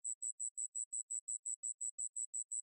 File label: Env Bug Cicada Loop